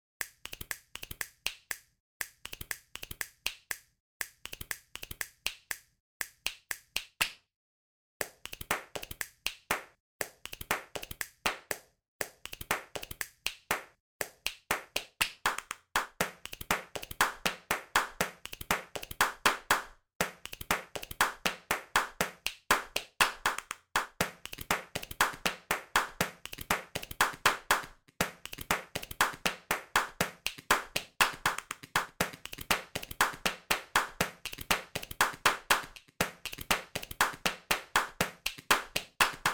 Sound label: Body Claps; Miscellaneous; StudioLucid